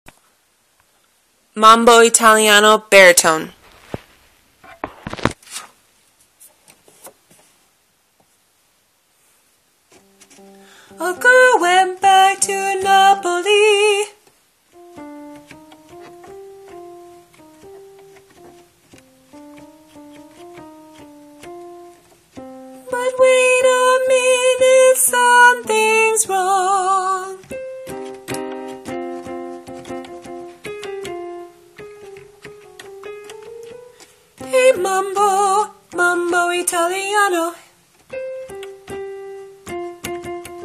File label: mambo bari